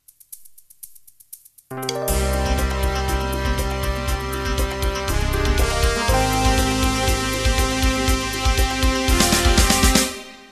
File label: Disco Jazz Intro